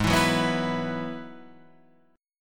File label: E Suspended 2nd G sharp; strummed; E2/G#; JGuitar